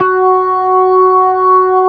F#5, FL Keys; Orchestral

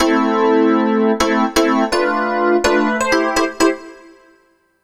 DEEPHOUSE ORGAN 125 A

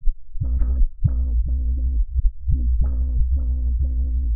110 D, Warped Bass; Loops; Deep Rez; Touch Loops; CosmicFunk

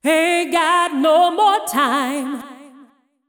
deep house female, 125, A, vocals

